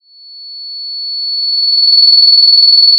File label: pop, Sound of a phone ringing